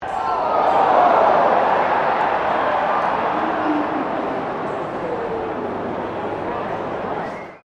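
crowd 40K Melbourne Cricket Ground aww low, Cricket at Melbourne Cricket Ground; Crowds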